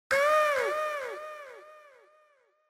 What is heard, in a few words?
Parody Accent Delay